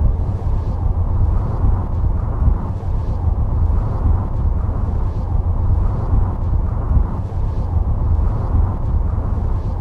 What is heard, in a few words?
WINDFX; Best Service; Advanced Mega Effects